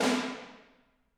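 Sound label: snare drum, Drums; Bob Clearmountain Drums I; SD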